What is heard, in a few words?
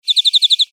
Brown thornbill mimics New Holland honeyeater